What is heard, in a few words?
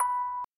orgel